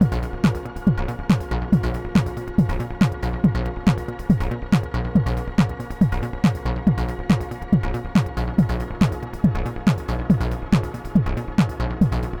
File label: jam; straylight